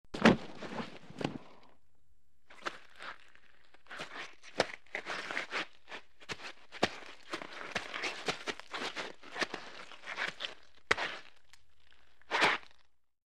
Snow footsteps

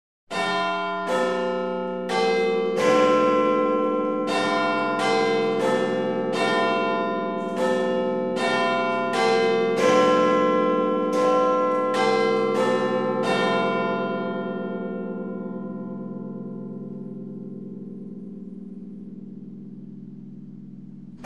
Clock tower, bell ringing, 1 p.m.; watches

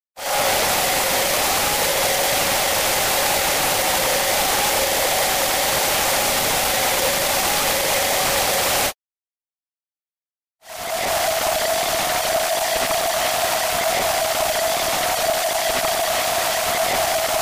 computer noise; radio signals

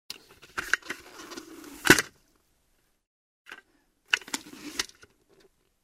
Motorcycle helmet put on and take off

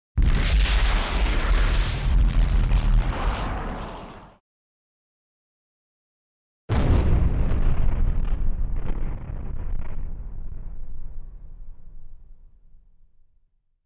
Energy blast; explosions

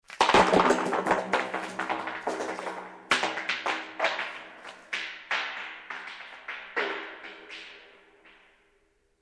stone rolls down the pipe

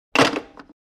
Hang up the phone; telephone

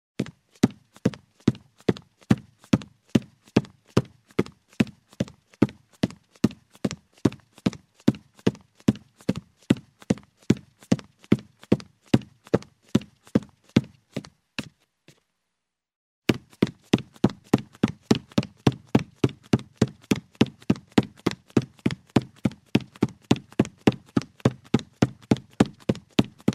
Running barefoot on a wooden floor; steps

